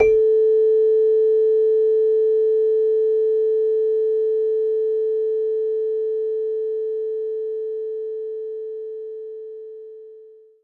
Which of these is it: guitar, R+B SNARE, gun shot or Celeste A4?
Celeste A4